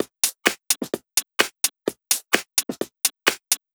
Loop, 128 BPM

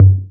PERC; Dubstep; DRUM HITS; Loopmasters; The New Sound Of Dubstep

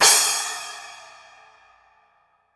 Crash, Cymbals

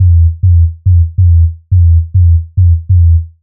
140 E BASSLINE, Dubstep

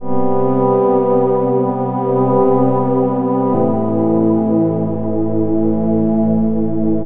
Bpm136 Mesmerise Pad Loop C A, Dubstep

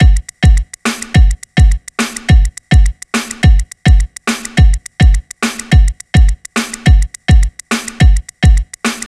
105 Factory, Breaks, 105 BPM; loops; Loopmasters; Jon Carter & Alex Blanco; Block Party Essentials

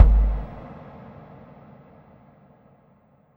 FX Impact

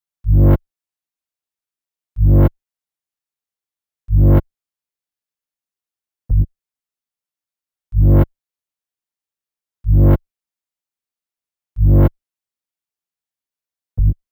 125 G Bass, Complex Electro Synths

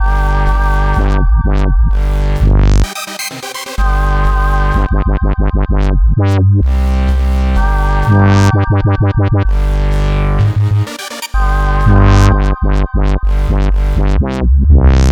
127 Gm Full Mix, Complex Electro Synths; Shoxx; Prime Loops

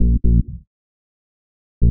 126 Bb BASS, Funky Underground bass loops; Loopmasters; Funky Underground Vol 2

